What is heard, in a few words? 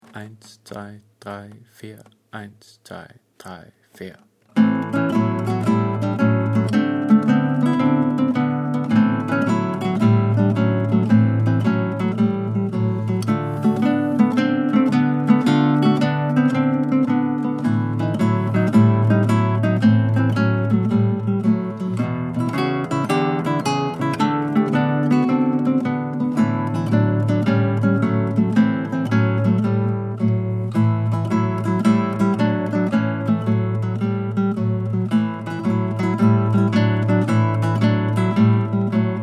Honky tonk Blues